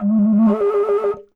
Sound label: moc li, MOCENO BASSFLUTE LICKS; PS-52 WORLD INSTR 2; WOODWIND AND BRASS